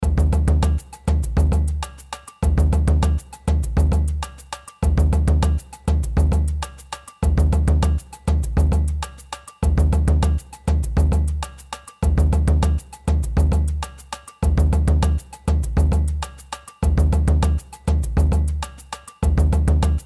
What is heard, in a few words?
hip hop surdo